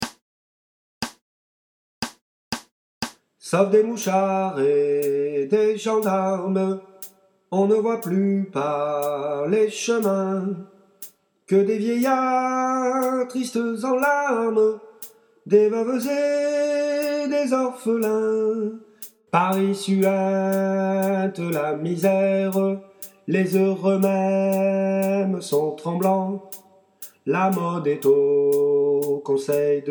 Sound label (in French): Medium, chorale; semaine sanglante